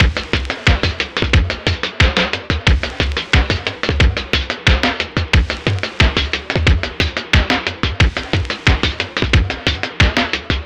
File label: drum